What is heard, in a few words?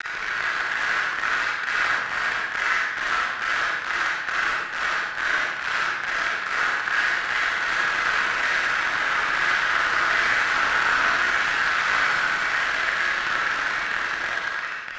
60 clappers unsyncronized